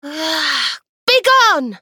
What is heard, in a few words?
swoo; dqxi; serena